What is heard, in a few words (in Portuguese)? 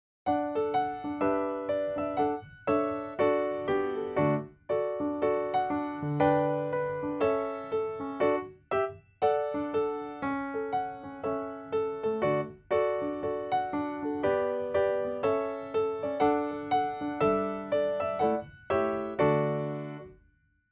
(Backing), playbacks musicas criancas; primeiros passos